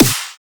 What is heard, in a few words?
snare drum; foxdot default